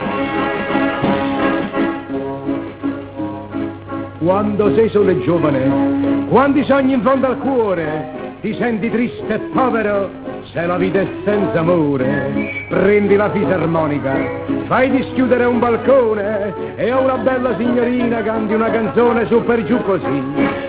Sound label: mazurka